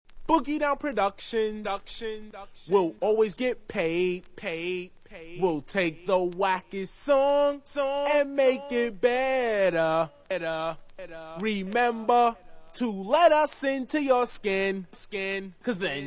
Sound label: VOCAL